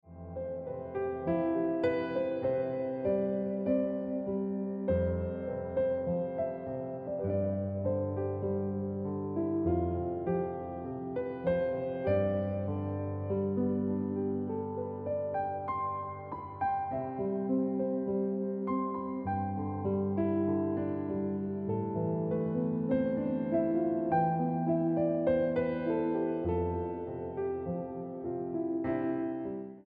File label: Piano Music